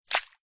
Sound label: wet sand handful throw into shallow water